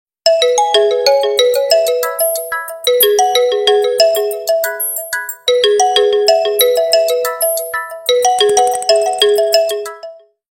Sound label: Lovely Xylophone, ringtones; Vivo